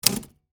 Typewriter Keystroke, Technology, Typewriter, Keystroke, Single; Typing; Offices